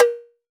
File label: Tabla Tak; Roland BK-5; Percussion